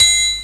triangle; percussion

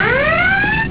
RED ALERT

